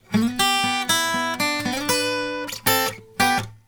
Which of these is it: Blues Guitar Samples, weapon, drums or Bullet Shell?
Blues Guitar Samples